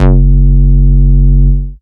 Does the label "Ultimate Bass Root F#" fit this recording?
Yes